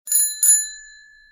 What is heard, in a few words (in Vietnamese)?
reng reng